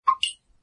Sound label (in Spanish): alerta